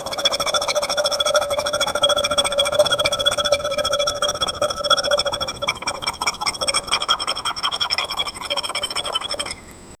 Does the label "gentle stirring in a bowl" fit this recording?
Yes